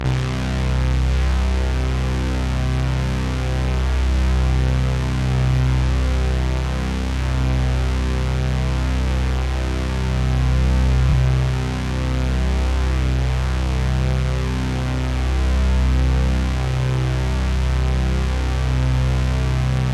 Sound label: Bass